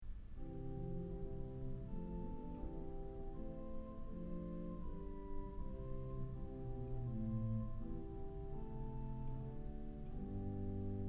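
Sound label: Choir Kleine Erzahler; Kellogg Auditorium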